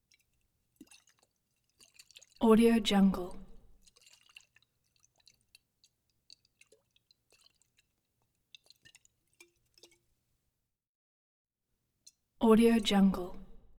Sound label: bottle slosh